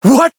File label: Voice damaged; majuou